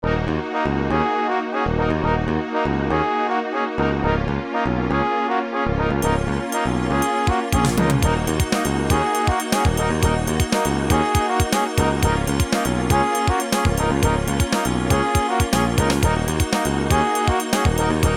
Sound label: FAILED; EDGE RALLY